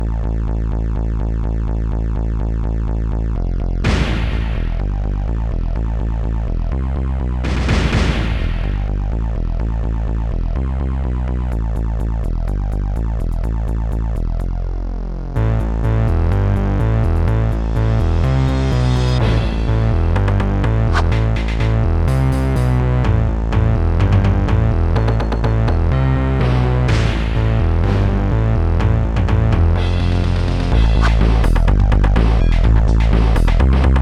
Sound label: kick; MusicLine